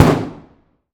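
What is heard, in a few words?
lmg sup urb int close rear player; atmo; gmod; viper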